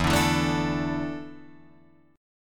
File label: E 7th Suspended 4th, Guitar, Drop D